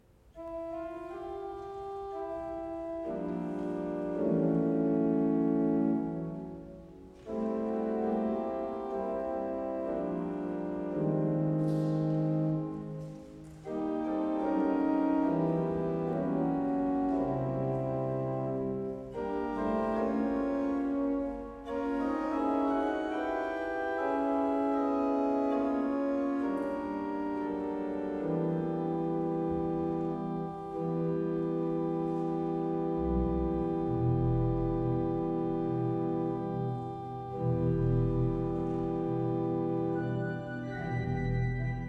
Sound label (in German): klangerlebnis orgel